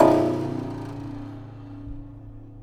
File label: Prepared Piano; Battery 3 Sample Kits